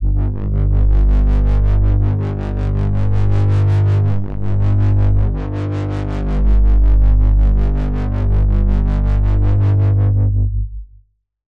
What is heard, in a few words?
SFX Nuclear Reactor; Sylenth1; Routed Sound; Sylenth1 Expansion - Quantum Enforcer Vol.1